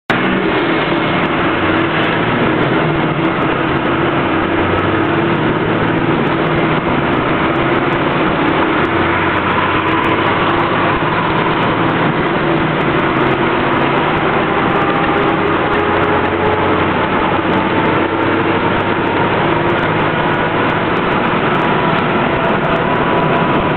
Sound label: Renault Midlum 270 Truck; کامیون رنو میدلان ۲۷۰